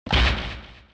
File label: Devil Mask Break; CalamityMod; tml